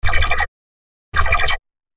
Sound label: laser dual cannon